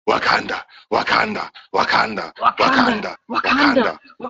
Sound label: wakanda chant